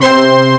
power brass; ST-XX Amiga Soundtracker Sample Packs

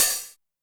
hihat, Zoom MRT-3B

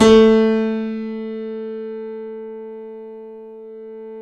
C7; East West; The Ultimate Piano Collection

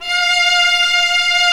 String Vlas Sect Agitato

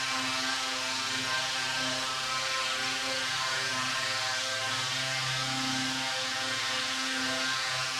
Drone; stereo toolkit samples; Non Tempo Loops

